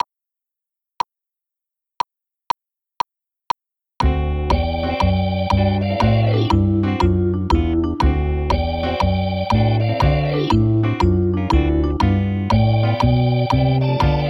Keyboard

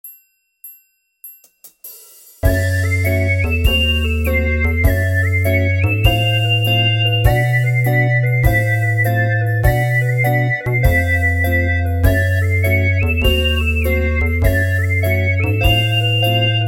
Ska Prep

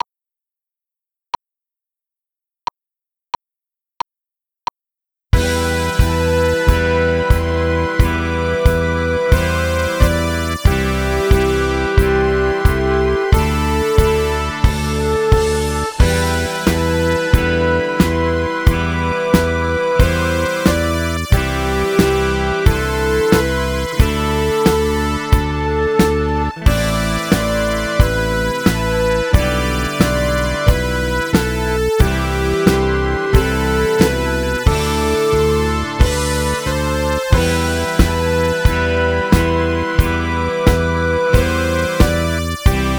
Keys Version

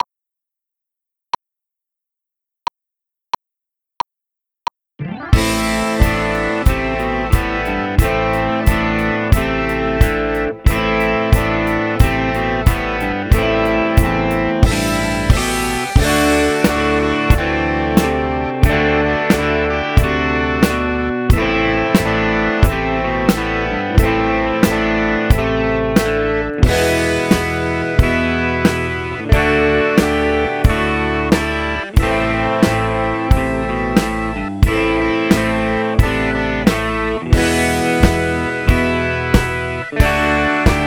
Rock GTR Drums

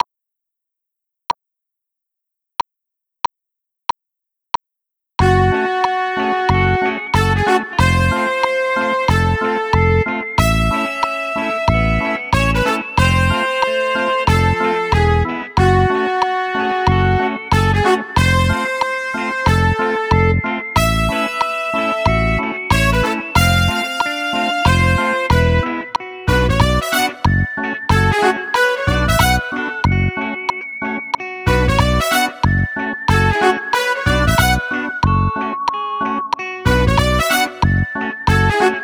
Keyboard

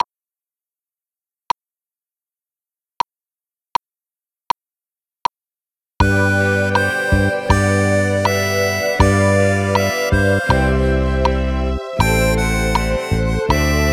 Keys

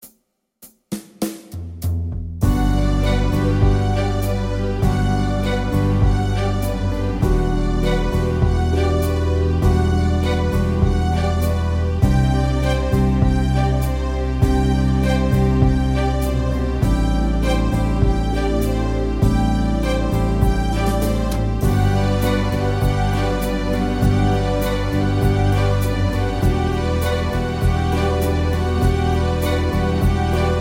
Rock Prep; Keys 2

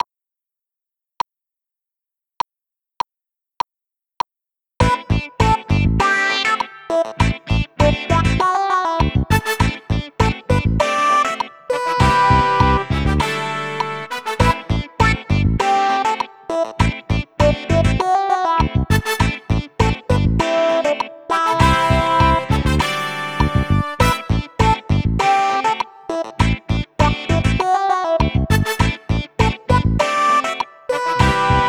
Keyboard

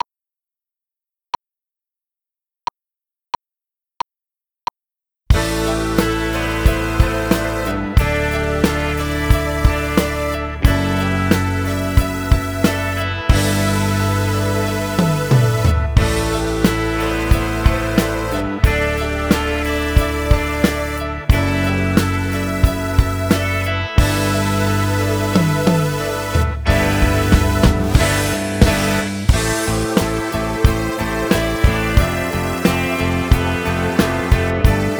Keys Version

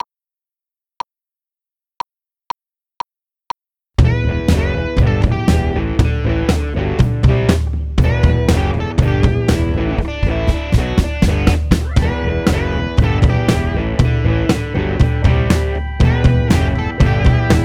GTR Drums

